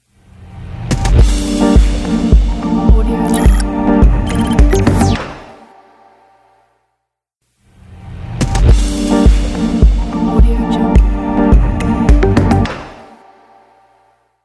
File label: deep logo